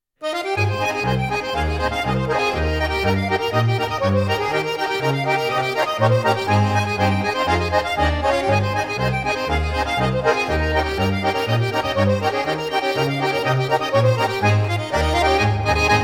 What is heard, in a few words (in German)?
Schwyzeroergeli Schottisch